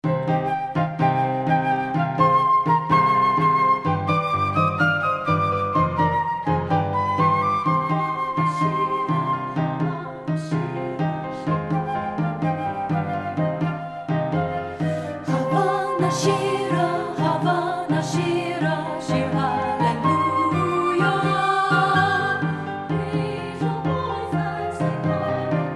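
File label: soprano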